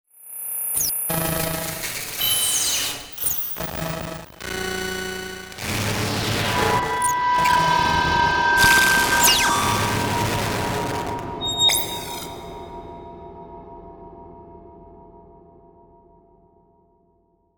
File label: Electrical Beeps Distortion Computer Noise; Production Element Title Imaging